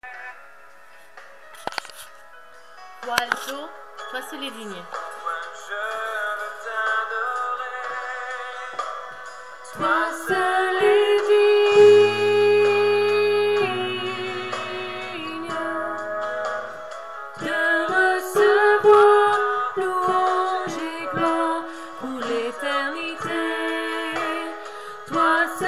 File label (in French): voix alto refrain